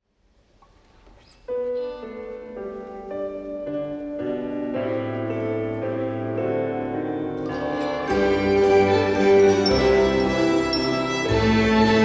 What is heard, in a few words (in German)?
Winterkonzert Streicher Merry Xmas; Leibniz